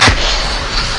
edge hit; LoL; xerath base

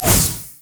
fracture consume; LoL; skarner

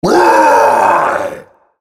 spell cast effort, en US; Rengar

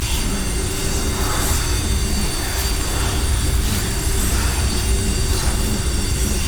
primal surge loop; nidalee base

